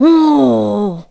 Grandma Surprised; Grandma surprised to see Link

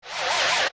Floormaster After Grow

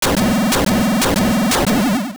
Collapse Full; Full sound of the Wing Dungeon collapsing; Zelda Oracle

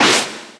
Jump; Sheik jumping (noises)

